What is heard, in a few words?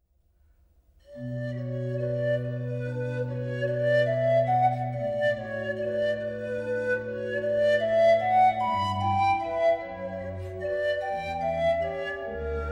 pipes, pan